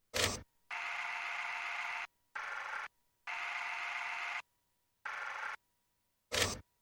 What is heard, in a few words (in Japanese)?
ライン 音量大きすぎレベルオーバー; inateck